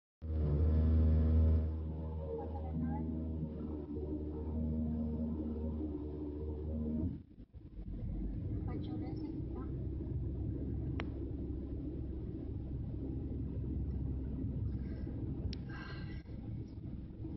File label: grinding brrr noise